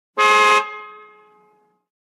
1960 Car Horn Large; 1960's

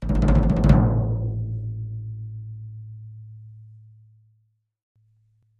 Drums Toms C Low Shrt, drums tom

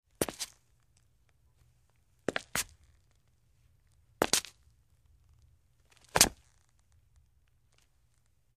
Footsteps Concrete

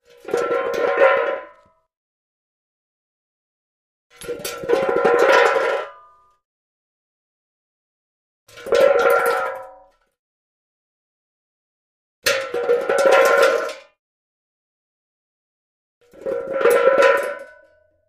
Pot Saucepan Lid Roll, pots & pans

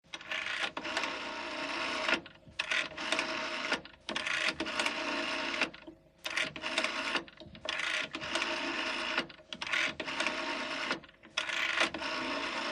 British Call Box Dial 7 Di, pulse rotary dialing

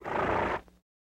Horse Blow Soft

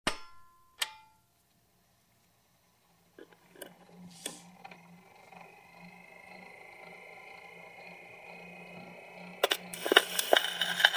Victrola Cue Single, record players

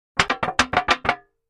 Aluminum Pan Buckle; barrels buckets & cans

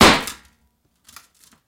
Glass Berry Jar Drop; bottles

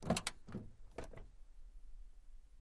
Car Door Open From

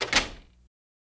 Door Open Thinne, half glass

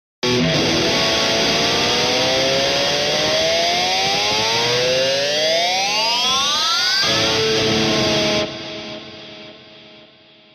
Elect Guitar Scream, electric guitar

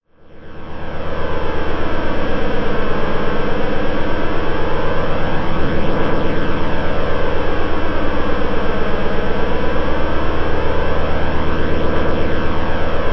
High Pitch Electro, alien

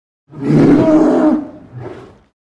Bear Polar Growl Inha

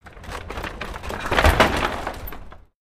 Carriage Jolts

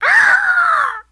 Eagle Scream Caw

